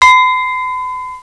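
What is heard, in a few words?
GLASS BELL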